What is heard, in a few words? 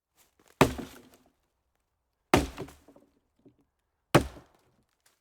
chopping log